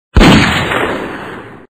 Pistole Grosskaliber

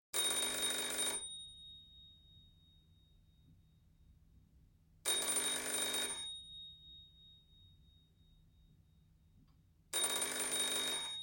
Telefonklingeln; Haushalt

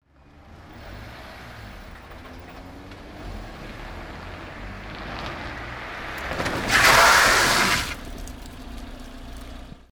Autobremse, Verkehr

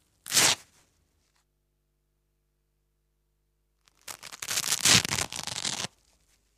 Heftpflaster ab; Industrie

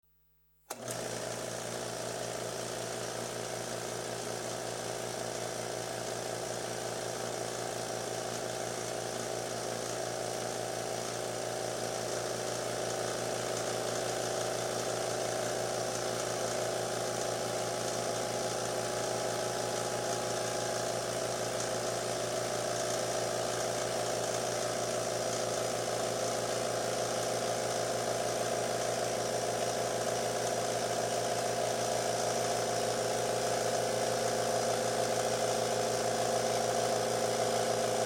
Generator klein; Industrie

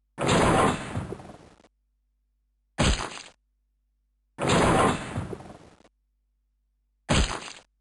Steinschleuder; Militaer

